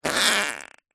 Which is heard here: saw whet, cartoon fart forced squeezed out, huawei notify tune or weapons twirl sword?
cartoon fart forced squeezed out